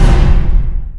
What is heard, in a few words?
projectile impact; Garry's Mod; popp5 lily love